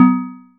Chord; ModelCycles

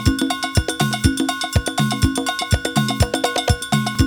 SOCA BREAK A, BREAKBEATS; Crypton - DrumBase5000

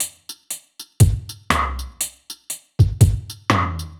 Drum Kit Dry 120, dub drums samples, 120bpm; musicradar

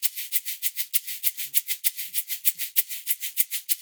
LOOP, Percussion Slam; InVision Interactive

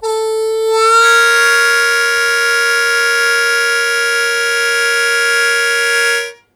Blues Chord Attack Eb1, Harmonica; Zero G - Harmonica

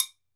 TOKE BELL, African Perc; E-MU Producer Series Vol. 7 – Old World Instruments

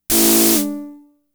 FX, Drum Machines; Fricke Schlagzwerg

